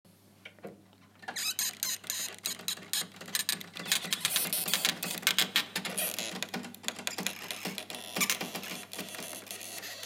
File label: door